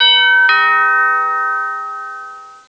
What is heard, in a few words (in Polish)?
dzwonek rurowy